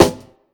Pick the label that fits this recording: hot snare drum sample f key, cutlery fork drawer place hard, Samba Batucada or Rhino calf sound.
hot snare drum sample f key